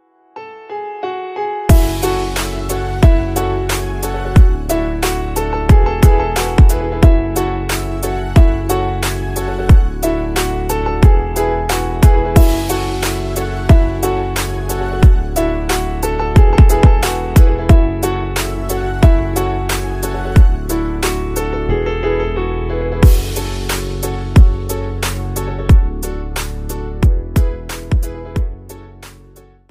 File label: instrumental ringtone bhakti ringtone; shiv; amar beatz